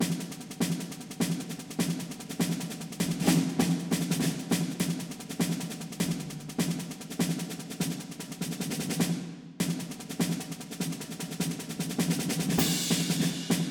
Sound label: Brass & Perc